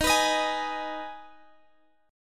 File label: D sharp Suspended 4th Sharp 5th D sharp, Guitar Half Step Down